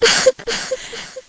Siren Roam; Carol

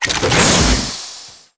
redeemer change; Monster Hunt

